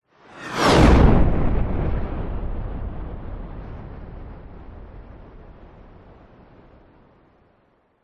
airplane fighter jet pass by low close extreme fast speed; vehicles; transport; whoosh